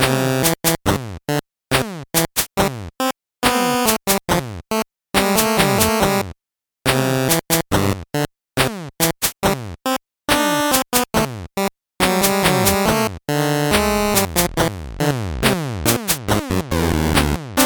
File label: 8bit bgm normal